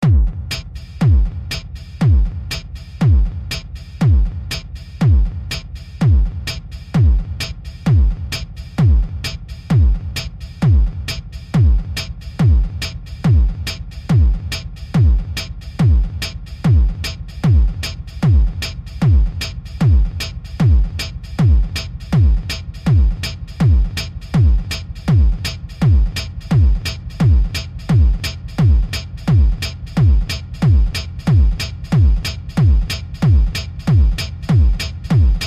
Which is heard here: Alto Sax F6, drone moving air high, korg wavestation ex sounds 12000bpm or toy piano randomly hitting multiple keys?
korg wavestation ex sounds 12000bpm